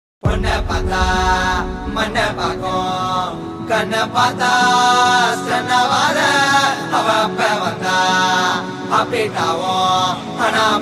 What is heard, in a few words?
Tamil Song Ringtone